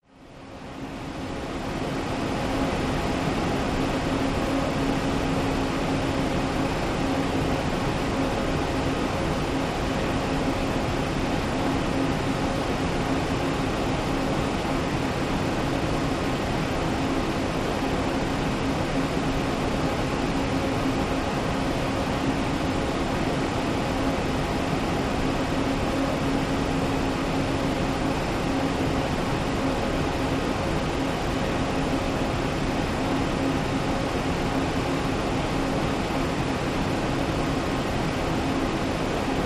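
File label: Industrial Fans Blowing; fans big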